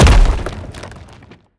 bash hard, brickwall, destruction

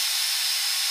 air, ambient; nuke

